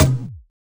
Percussions

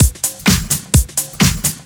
Straight Loop 128 BPM, House

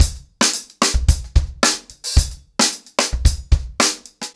110BPM, ACOUSTIC DRUMS

